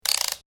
Clockwork Windup, Slow Winding Of Small Clockwork Motor; Wind Up Toy; Wind Up Toys; Stuart Duffield